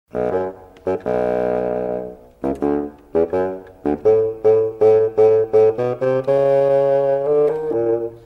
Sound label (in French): basson grand pere